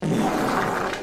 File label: all the farts, Wet Fart